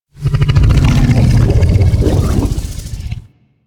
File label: Vocal Idle, critter; Warframe; Hunting Critters; Undazoa